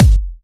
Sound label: Kicks; Vengeance Samples